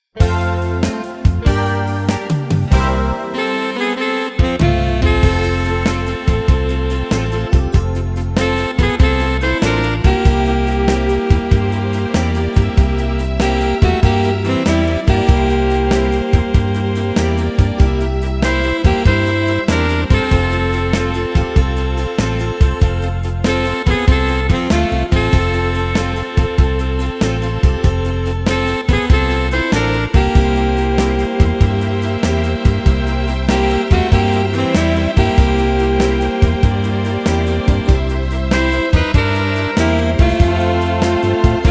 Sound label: sax medley, KORG PA800; SAXY-medley